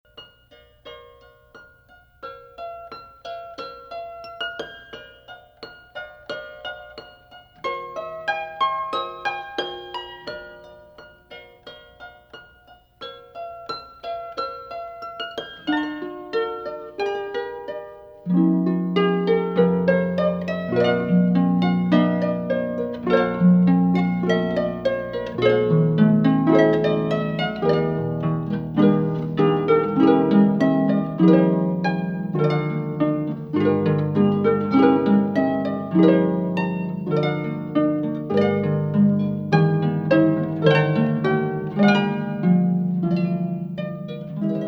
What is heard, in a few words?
Solo Harp